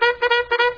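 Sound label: trumpet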